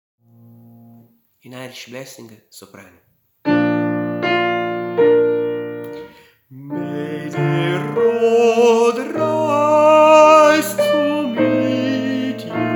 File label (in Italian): soprani